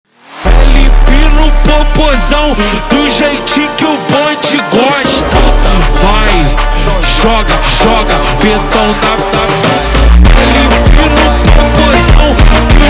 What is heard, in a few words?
Brega slowed; funk